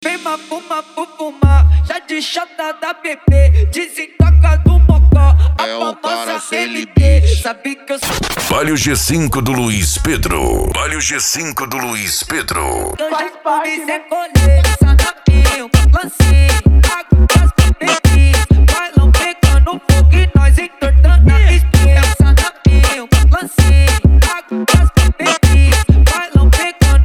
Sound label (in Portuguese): funk mega bass e forro; urupa ro